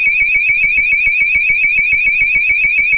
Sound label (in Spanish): tono